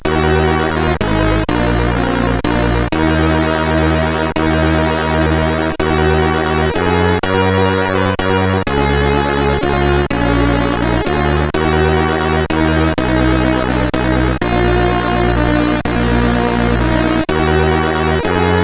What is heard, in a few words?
Greensleeves sid CSG8580R5